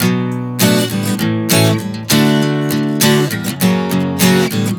Progression 100 Bm-A-D-F#7, Acoustic Guitar, Chord Progressions 100 bpm